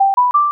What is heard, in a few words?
boot